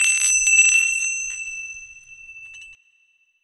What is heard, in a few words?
Indian Bell Hit Loop, Ethnic Instruments